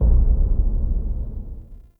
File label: Transmission Low Boom; Duviri Quest; Thrax